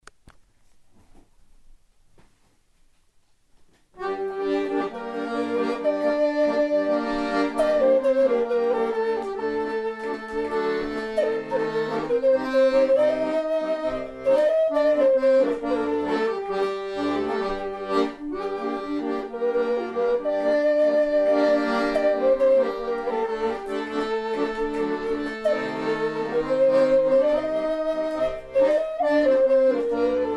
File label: Klezmer